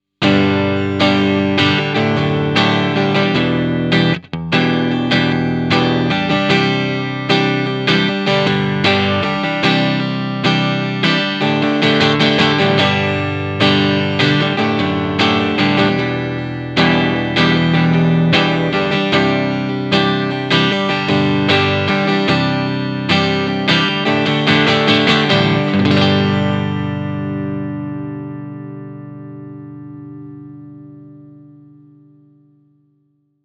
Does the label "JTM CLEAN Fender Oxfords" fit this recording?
Yes